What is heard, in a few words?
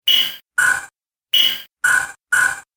Arcade Game Rough Robo Machine; Video Game Sound Effects; Retro Inspired Sound Effects - Think Nintendo, Atari, C64